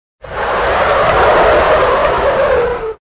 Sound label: tire squeal; Soldier Of Fortune II Double Helix; XBOX; ambience